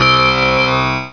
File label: enter